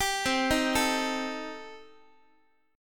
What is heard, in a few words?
C Minor 6th Guitar, chord; Cm6 arpeggiated; JGuitar